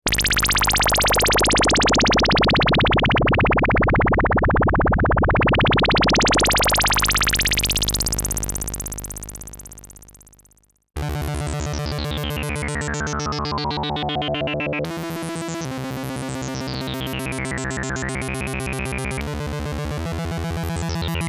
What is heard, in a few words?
Mod Bass